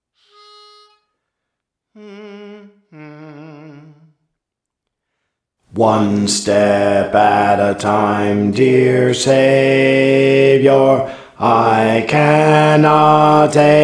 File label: Bass; Praise For The Lord Training